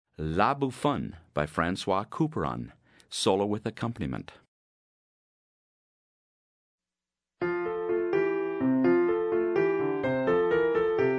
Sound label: Alto and Accompaniment; Grade 11 Solos